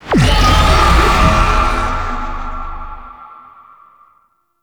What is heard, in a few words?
tank infested heal lure call; Enemies; Abilities; Lasrian Tank; Warframe